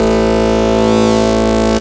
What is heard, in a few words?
saw lead synth